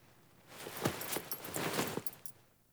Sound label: Foley Emote Sit, Clothing; Characters; Player